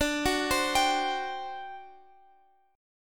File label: D 11th D, down; D11 arpeggiated; JGuitar